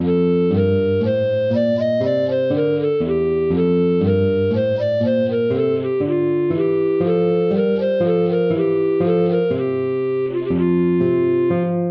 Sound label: baroque minuet major